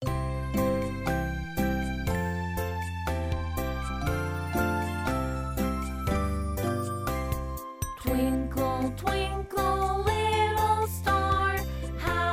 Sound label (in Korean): 영어여 노래; twinkletwinkle